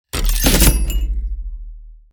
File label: Steampunk heavy mechanical movement